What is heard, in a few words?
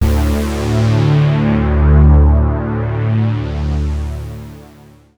NRG Pad